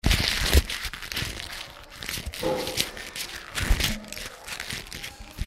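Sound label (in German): knüllen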